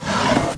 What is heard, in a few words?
WOODEN BOX OPEN